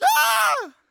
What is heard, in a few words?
male scream